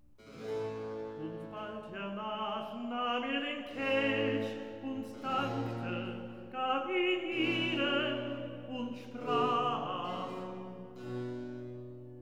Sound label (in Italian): Recitativo; Und bald hernach; Brockes Passion